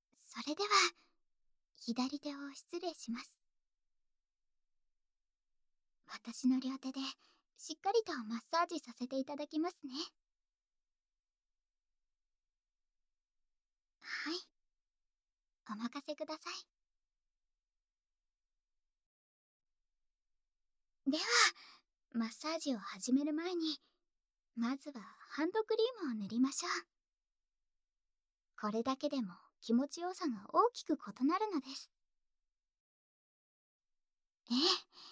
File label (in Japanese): ハンドマッサージ, ASMR; ハンドマッサージでストレス解消です